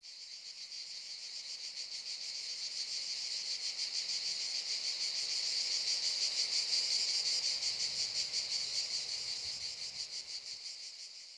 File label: wetland forest day cicada swell; Ambience